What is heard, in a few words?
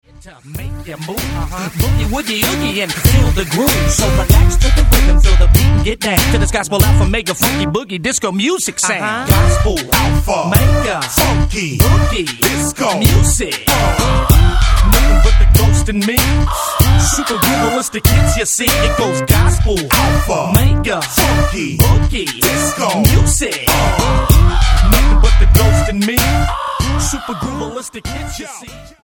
gospel alpha mega funky boogie disco music